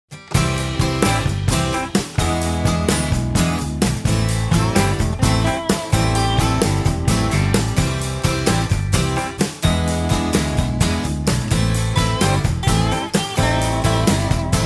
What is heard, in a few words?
unplugged